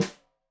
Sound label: Snare Stereo, Drums; Deeply Sampled Drums; SMDrum